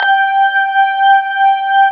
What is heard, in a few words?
B3 HAM, Keyboards Old School